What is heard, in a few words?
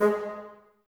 Bassoons G#3; Miroslav Vitous; Woodwind Brass Ensemble; Wood Winds; Bassoons Links